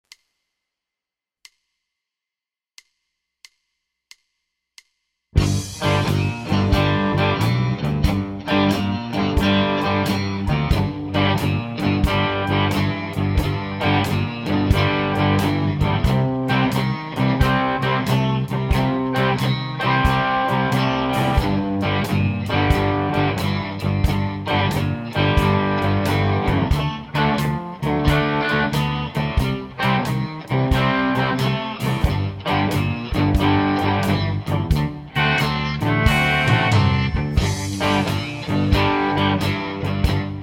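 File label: Shuffle Boogie 140, harmonica; Средний минус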